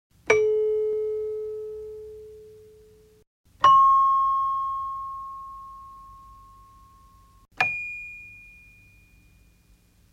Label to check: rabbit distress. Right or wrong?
Wrong